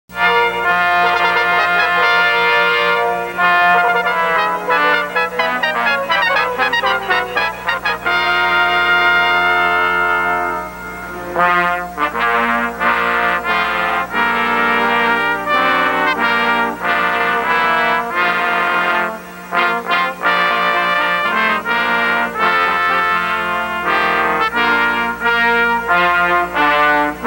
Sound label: Anthem, The Star Spangled Banner, Quintet; Martin Schmid Blechbläsernoten